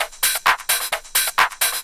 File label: 130 addon, DRUMLOOPS, HIHAT; Ueberschall; Techno Trance Essentials; LOOP ADDON4